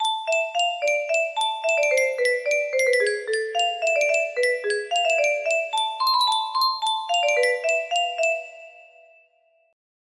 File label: 5 semitones down music box melody